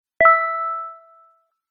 text receive

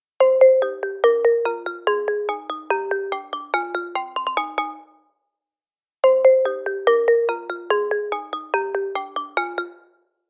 Phone ringtone; Sound design for the opera composer: concepts and methods

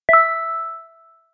text receive

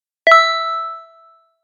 text receive

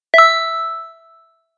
text receive

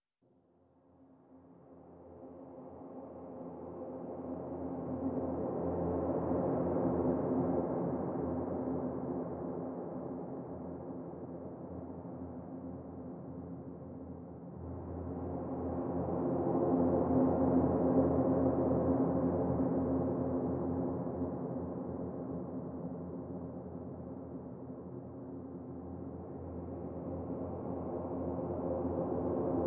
wave sequence; Sound design for the opera composer: concepts and methods; Her face was of flowers audio cues

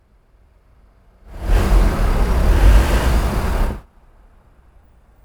enhanced traffic noise; Sound design for the opera composer: concepts and methods; Vicky and Albert audio cues

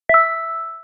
text receive; Sound design for the opera composer: concepts and methods

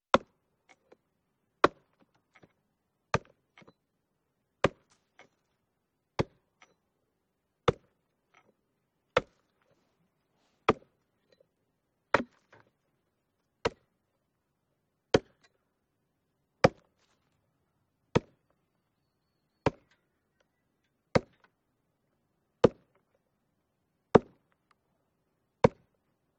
axe at 80bpm; Sound design for the opera composer: concepts and methods; Her face was of flowers audio cues

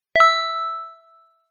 text receive

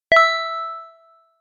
message receive; Sound design for the opera composer: concepts and methods; Vicky and Albert audio cues

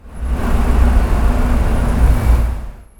enhanced street sound; Sound design for the opera composer: concepts and methods; Vicky and Albert audio cues

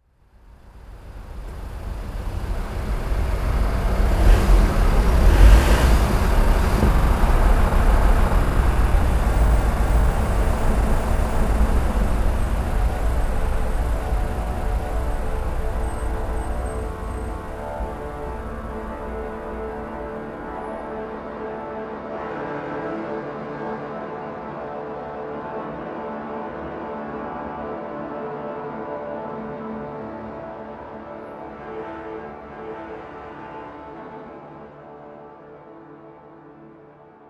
bus and traffic noise; Sound design for the opera composer: concepts and methods

